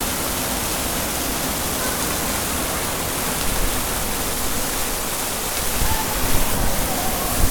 Giant Waterfall Fountain In A Park; Walkway In The Fountain; San Francisco 6.22.2017

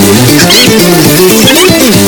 adding a distorted gabba kick to a song

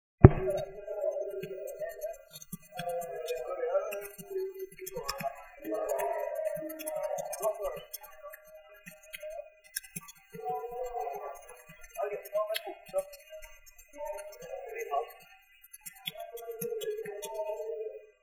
dictaphone key taps almost removed

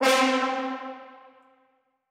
Horns Spicc C4; Aubit; ChainPop Vol. 1 Noises

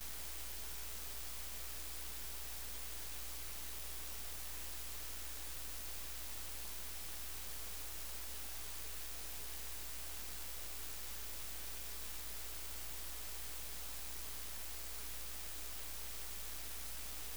Outdoor Extension Cord; Electronics Of My Grandma's House

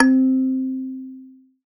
Noises Kalimba; Synthetic's Serum Collection; Production Master - Vital Future Bass 4

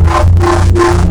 wavetable screech; Synthetic's Serum Collection; Audeka Wavetables

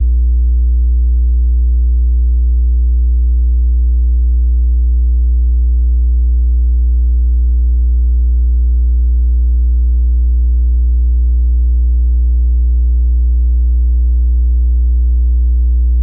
Outdoor Airconditioning Unit; Electronics Of My Grandma's House

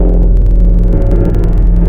Additive Waves; Synthetic's Serum Collection

